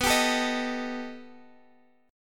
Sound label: B Augmented 7th B down Guitar Standard; B+7; strummed; JGuitar